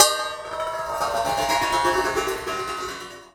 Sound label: Prepared Drums